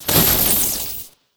Plasma Magic Medium Impact; RPG3; Cielonos; Wwise

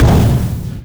EXPLOSION Bang Muffled; Cielonos

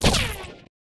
Plasma Magic Beam Shoot; Cielonos

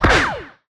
gun shot pistol; Cielonos

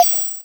tech interface computer beeps; ichni; Wwise

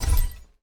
magic cast light; Magic Arsenal

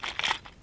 Equip; Pixel Fantasy

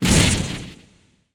Electric Magic Dark Impact; Cielonos

